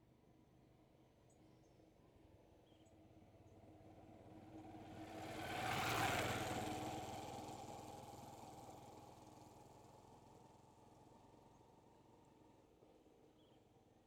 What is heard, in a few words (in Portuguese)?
Moto passando pela direita em ambiente rural com grilos; maquinas